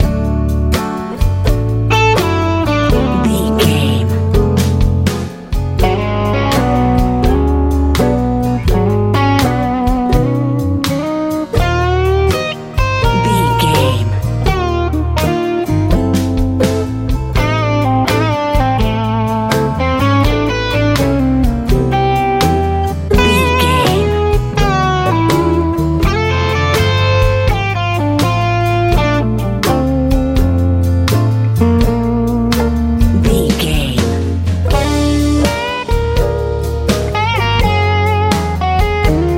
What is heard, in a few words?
easy pop